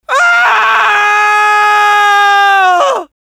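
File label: pain critical death, French; Scout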